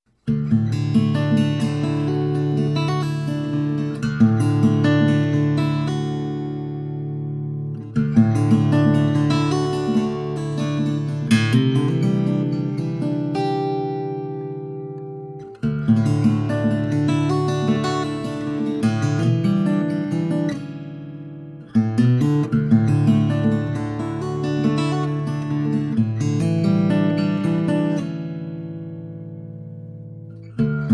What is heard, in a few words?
Guitar